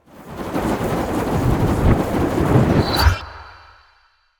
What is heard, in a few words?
Ice Spell Freezing Slowing Icy Chains; Arctic Aura